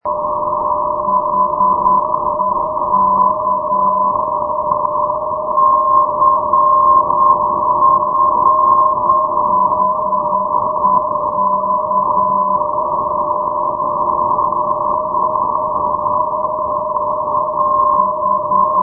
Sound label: ndb heka; europe